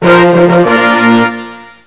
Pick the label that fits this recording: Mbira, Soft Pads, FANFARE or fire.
FANFARE